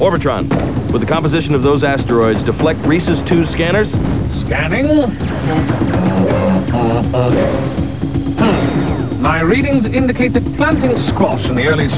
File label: Squash